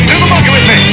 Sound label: monkey